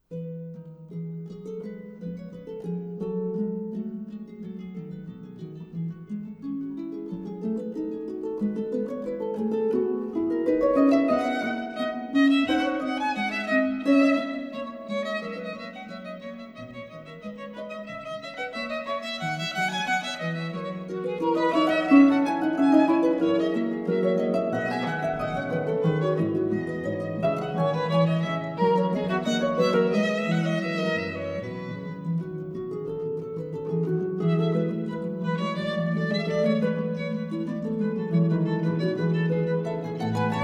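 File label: Sonata for Violin and Keyboard; Allegro; J.S Bach; 6. Allegro; Sonata No. 3 in E minor, BWV 1016 for Violin and Harpsichord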